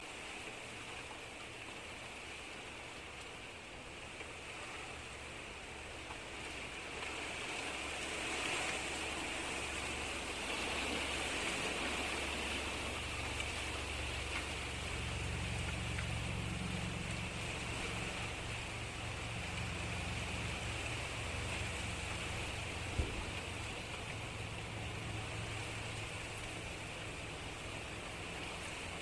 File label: Wind Blowing